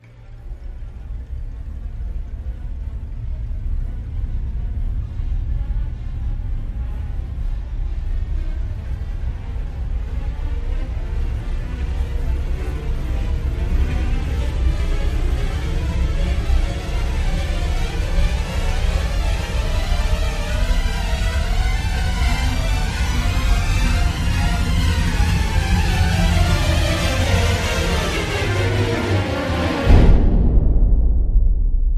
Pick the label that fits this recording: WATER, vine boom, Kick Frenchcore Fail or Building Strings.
Building Strings